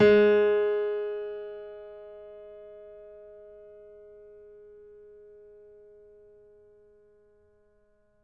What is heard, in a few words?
piano; East West; The Ultimate Piano Collection